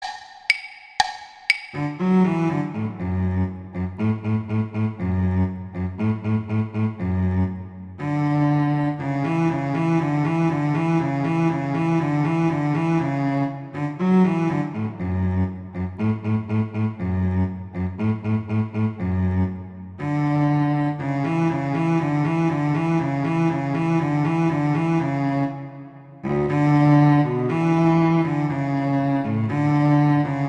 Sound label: Cello I; Duet 4; Mozart; Duets